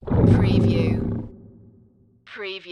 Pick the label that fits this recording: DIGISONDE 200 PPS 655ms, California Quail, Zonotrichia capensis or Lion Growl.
Lion Growl